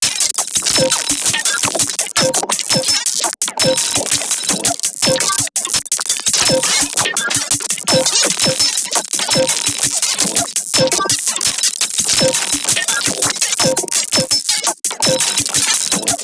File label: perc loop